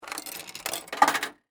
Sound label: Arcade Game Insert, Technology, Arcade, Game, Insert, Quarter, Into, Slot; Arcades